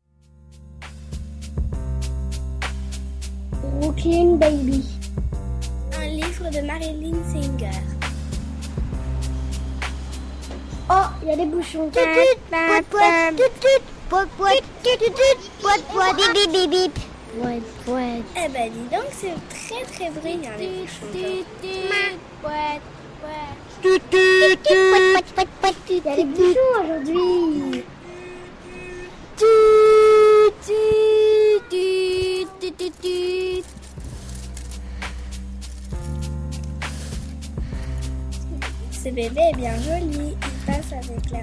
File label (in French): brooklyn baby atelier bruitage